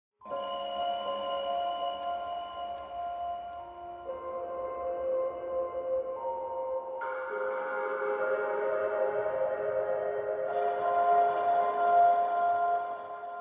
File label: Ambient